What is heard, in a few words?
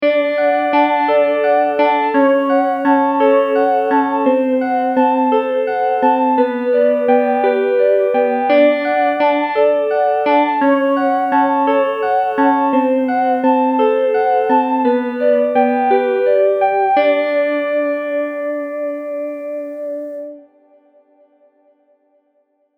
Box Wood Piano; gforce software